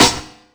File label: SP1200 snare; E-MU Formula 4000 Series Vol. 1; Hip Hop Nation